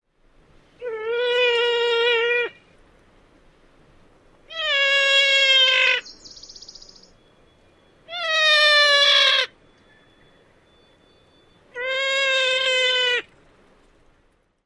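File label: distraction squeals female, Ruffed Grouse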